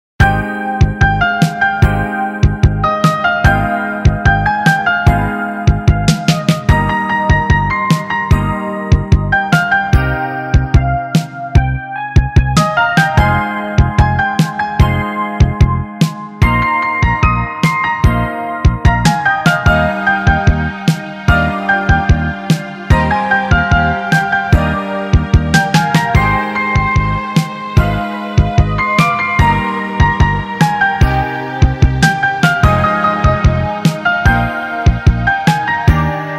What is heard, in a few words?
romantic